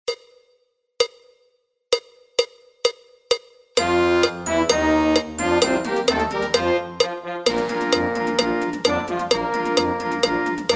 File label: Fight Song MM 130, band